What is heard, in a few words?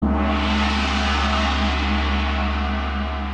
smash gong